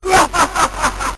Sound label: laugh evil robot; ooh ahh